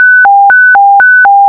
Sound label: Emergency Alert, scanner